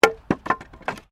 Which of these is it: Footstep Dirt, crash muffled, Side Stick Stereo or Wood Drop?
Wood Drop